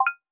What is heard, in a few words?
pick rising